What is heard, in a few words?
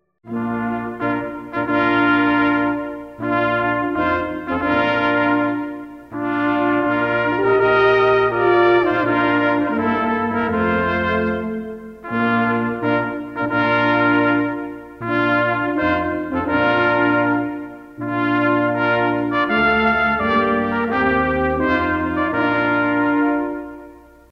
Bridal Chorus